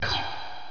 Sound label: scifi